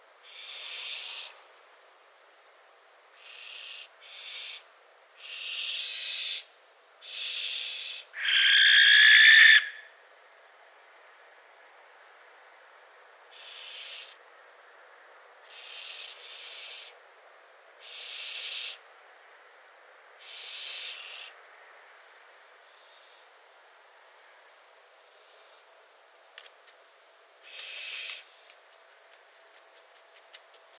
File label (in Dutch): Kerkuil Tyto alba